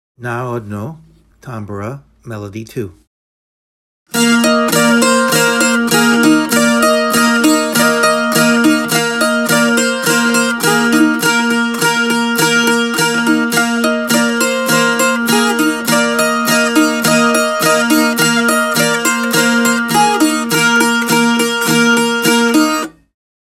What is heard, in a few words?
tambura; Narodno